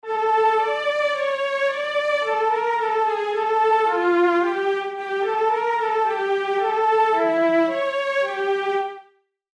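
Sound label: D minor 120 bpm, Classical MIDI Melody; Prick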